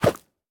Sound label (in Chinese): 山羊跳跃声